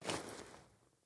Foley Action Default Block

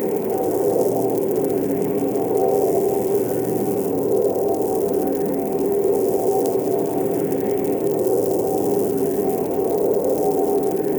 Maze Lock Loop; Warframe; Duviri; Medusa Puzzle

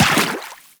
Step Water, Footsteps; Warframe; Teshin

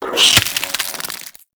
Devour Enemy Bite; Warframe; Mummy

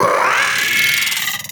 Amalgam Moa Vocal Attack

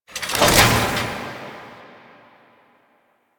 Foundry Build Mech Up; Interior; Ambience; Warframe; Nineteen Ninety Nine; Tailor Shop

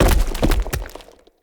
Pillar Stone Medium Destroy Chunk

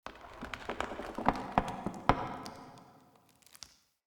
Wake Up Loop Root Creak; Cinematics; Bromius; Bromius Quest Wake Up Loop

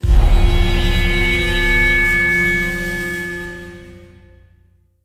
Poisoned Cough Stinger; Bromius Fight; Neathuns

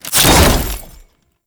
Ammo Cast Whoosh; Frumentarius

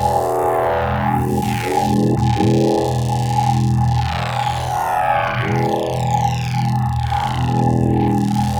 Squad Link Satellite Beam Loop; Railjack

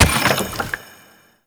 Excavation Gas Pickup; Gameplay; Warframe; Nineteeen Ninety Nine; PvPvE

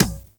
Beach Ball Kick; Warframe; Water Fight; Beach Ball Floof

